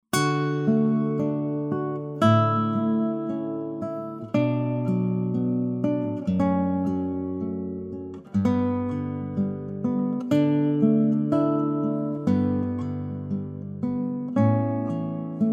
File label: Canon In D, classical guitar